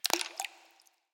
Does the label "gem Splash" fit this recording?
Yes